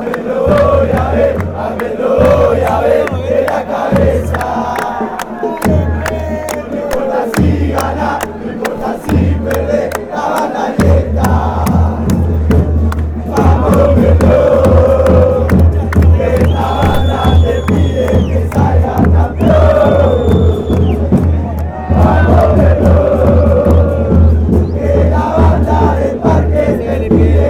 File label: chant; deportivo merlo